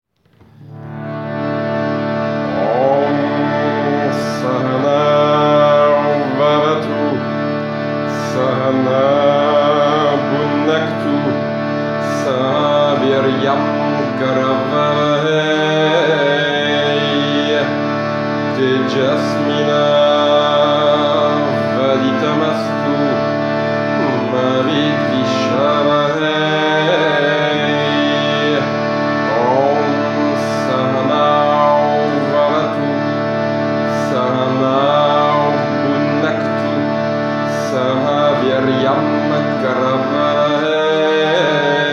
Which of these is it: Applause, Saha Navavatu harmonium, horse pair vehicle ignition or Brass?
Saha Navavatu harmonium